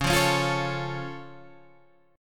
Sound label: Db Augmented, down, Guitar, Open G